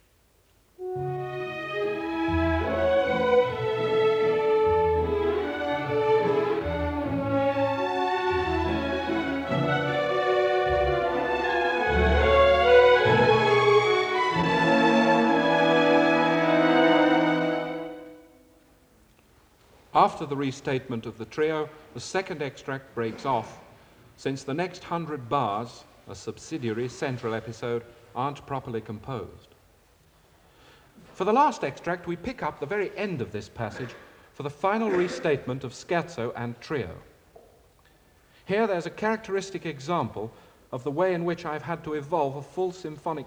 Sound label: Music Example (Orchestra); Deryck Cooke; Illustrated talk, Symphony No.10